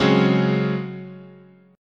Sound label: C sharp Major 7th Suspended 4th, guitar chord